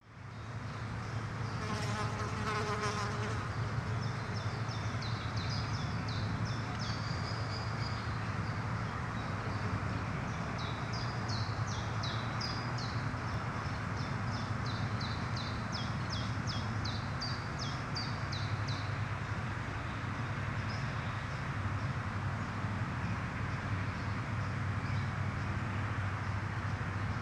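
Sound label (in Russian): звук летнего вечера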